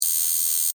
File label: Beep Negative Buzz, Scifi, Beep, Negative, Buzzer, Science, Fiction; Tech; BlastWave